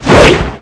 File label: warrior weapon swing